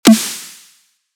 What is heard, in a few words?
snare glue